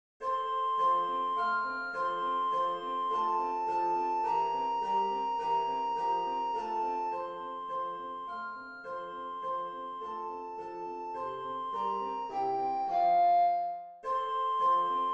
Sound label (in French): danse allemande; beethoven ludwig van